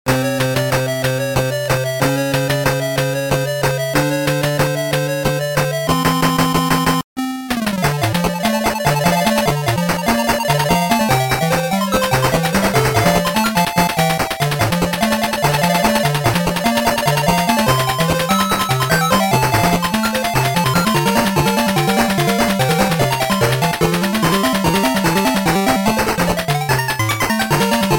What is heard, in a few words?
Forest; Poloy